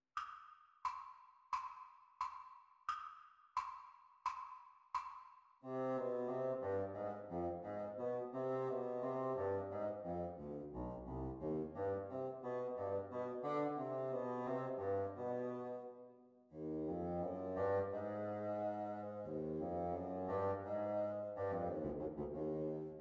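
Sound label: Bassoon Duets; Exam Speed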